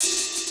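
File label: processed hat open, drums; Crypton - DrumBase5000; PROC.DRUMS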